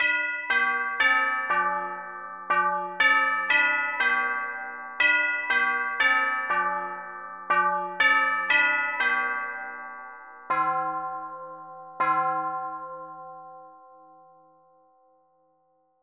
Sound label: Wedding Chimes